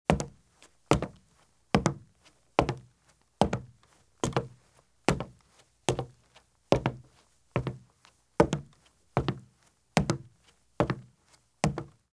Running Shoes Walk at Medium Pace on Wood Porch or Footbridge; Sound Ideas; Footstep Sound Effects